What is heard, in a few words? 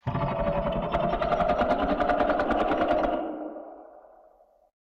Figure Growl; Фигура из Роблокс Doors